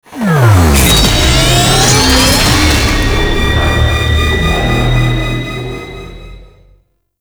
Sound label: Fully Charged; Liset; Warframe; Awakening Remaster